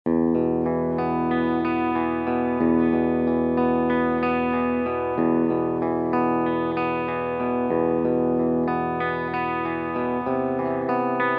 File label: Eclipse Clean, 7string